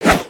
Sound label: Sword Slash; aronwk collection; ndaudio